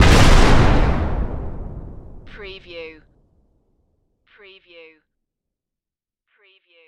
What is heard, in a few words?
Explosion Heavy; Sound Effects Bible